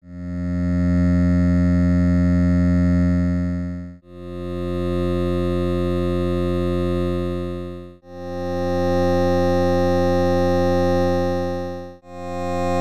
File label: ring